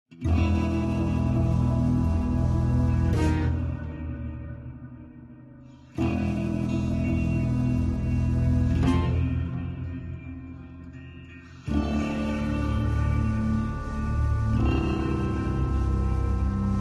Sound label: Loop Tense; Tales from the Borderlands